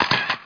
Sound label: camera; CATZ; KITTENZ